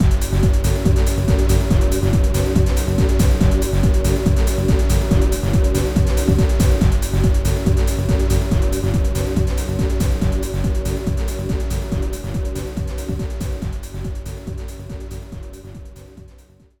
Lose; Arkanoid 3D

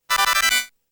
Extend Sound; Galaga; Namco Classic Collection Vol.1; arcade

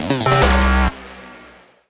hunt fail

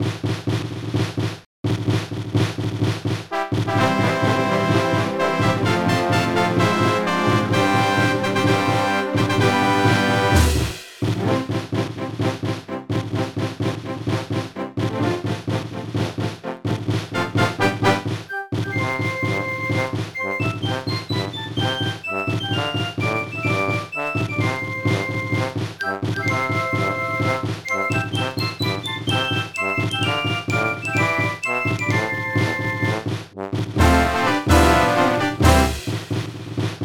Eerie Island; Kirby Mass Attack

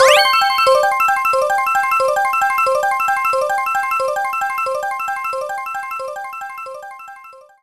SFX Item Item Box; Mario Kart 8

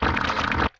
wet pump

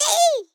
rat victory; Rats

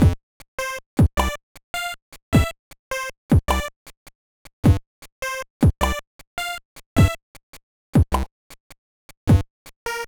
BGM; card e reader 2001 gba

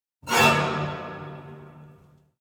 Dagger Sequence Musical Stinger; Hearthstone; REV

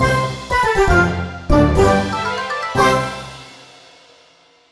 Jingle Popup Continue

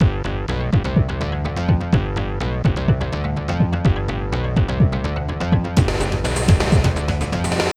Tennis - Clay Court; Super Monkey Ball 2; Clay Court 1

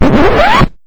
Stingers scratch; bear party adventure

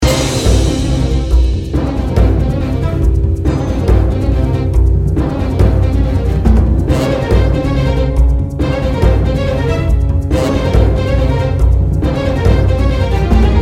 Orc Wars (battle - boss)

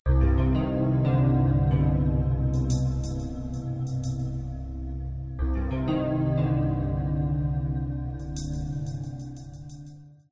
Discover Cave MUSIC

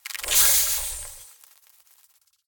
Flying Insects, alerted

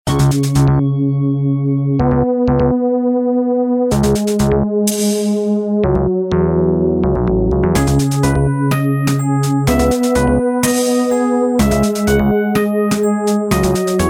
Music Intro; skein linux macos windows gamerip 2017

